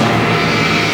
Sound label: A3 HIT, SYMPHONY; Northstar - The Wizard VOL-1